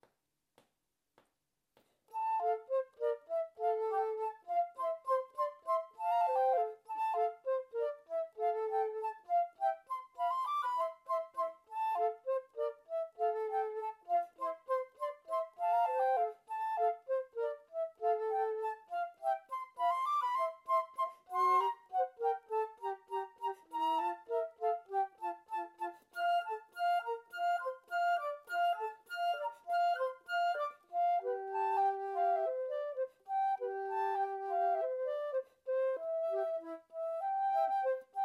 duet allegro scherzando; duets for all

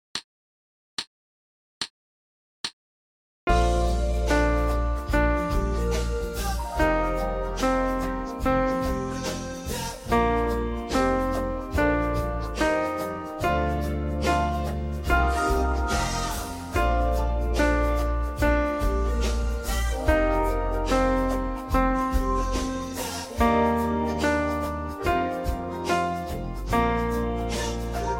72 bpm

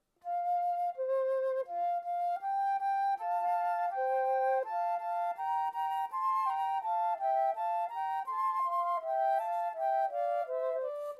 duet

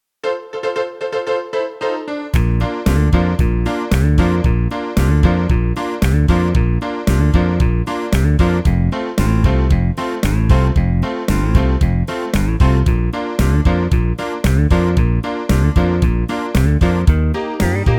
trumpet

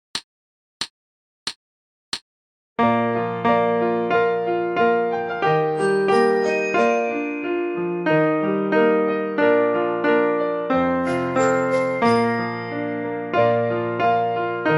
92 bpm

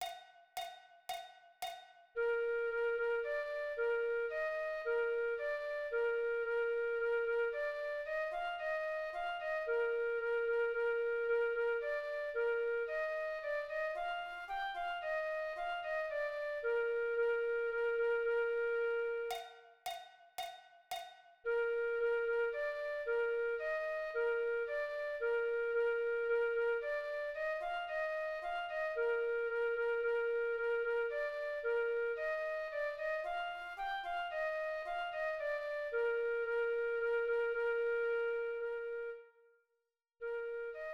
fanfare for concert duet, b line only

